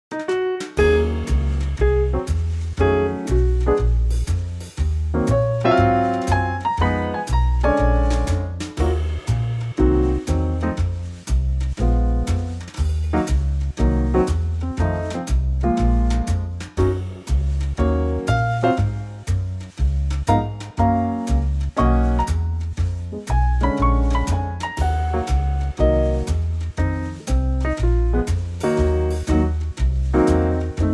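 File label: Ebm, Backing Tracks for Male Vocals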